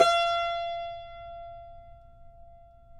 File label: Cumbus F 5; Spectrasonics; Hans Zimmer Guitars VOL-2